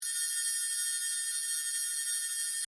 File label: Laser Beam Cut High, Pitch; Laser Sound Effects; BlastWave